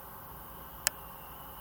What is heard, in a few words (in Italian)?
tocco su registratore